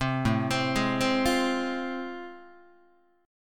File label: A Minor Major 7th Sharp 5th, Guitar; arpeggiated; AmM7#5; JGuitar